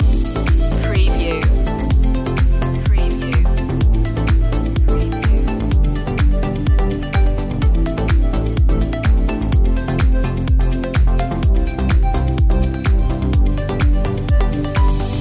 positive direction loop

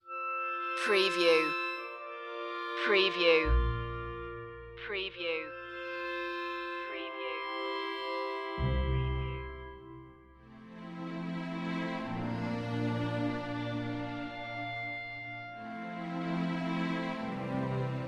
no choir version

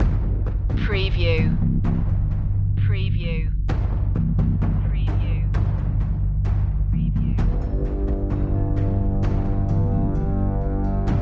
no melody

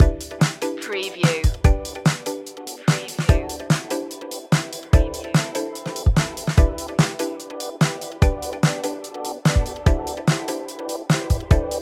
summer breath medium

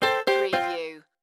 Casino Electronic Sound Game Over

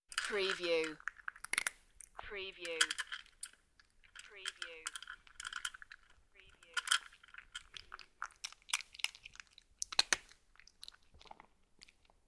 Cat crunching biscuits and chewing; beanstalk audio

